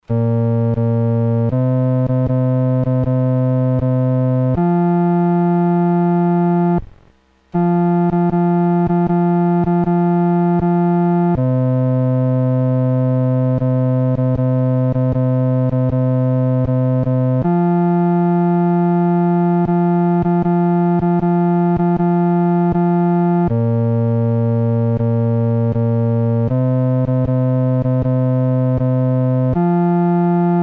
独奏 第四声

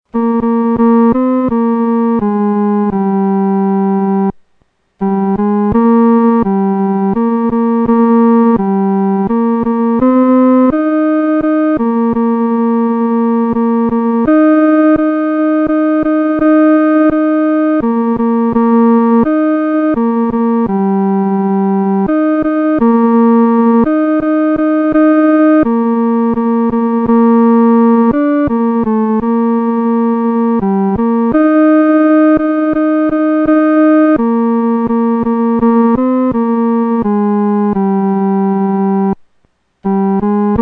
独奏 第三声; Clara Tear Williams, 1858-1937

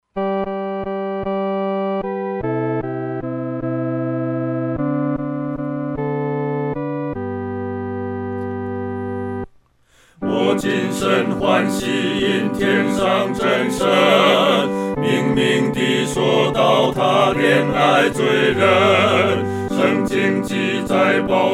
合唱 四声部

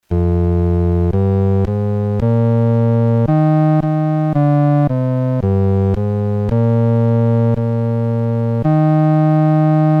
独奏 第四声

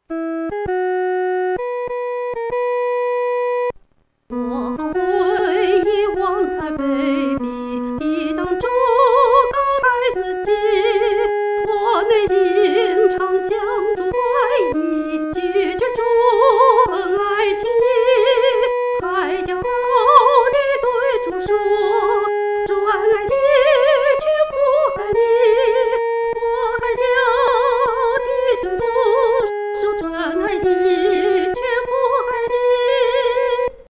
独唱（第一声）; 何等痛悔

